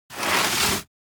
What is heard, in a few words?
Light furniture sliding on floor moving